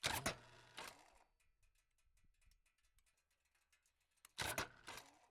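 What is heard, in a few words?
Chopper onboard false startups engine left, Chopper Custom V-Twin; DPA4062; Pole Position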